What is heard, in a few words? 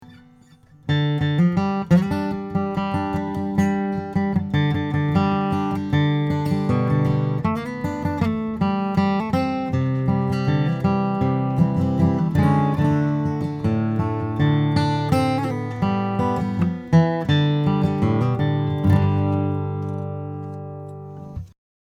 Guitar